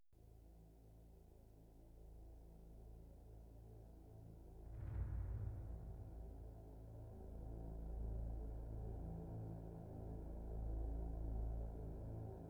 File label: Celtic Segue, Music background pads